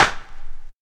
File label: Big Room Clap Sound G Key, Royality free clap sound tuned to the G note. Loudest frequency: 1432Hz; single hits one shots